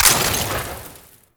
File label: Ammo Pickup Heat; Ammo Ability; Warframe; Warframes; Frumentarius